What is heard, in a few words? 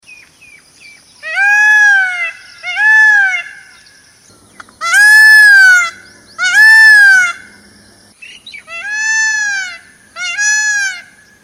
Peacock Sound